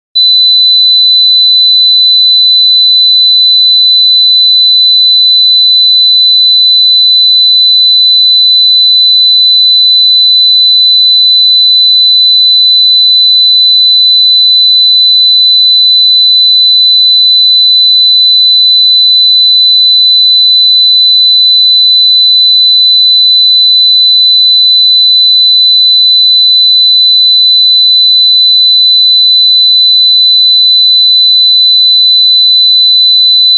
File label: 4 Khz